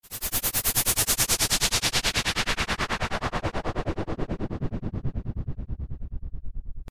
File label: Pink Noise Effix Delayed; Techno